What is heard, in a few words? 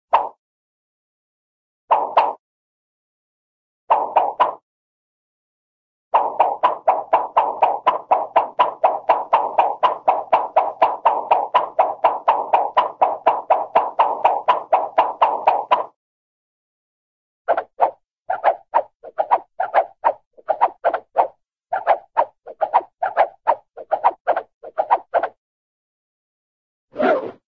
swish, cartoon single sword swish